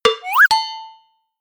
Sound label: notification; samsung Aleart